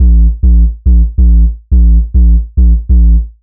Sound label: 140 A BASSLINE, Dubstep